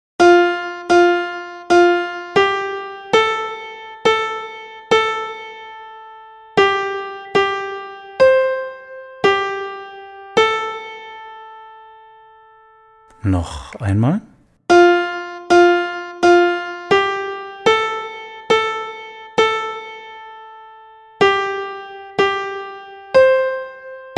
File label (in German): Melodie